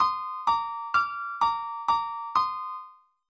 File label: alt rhythm slow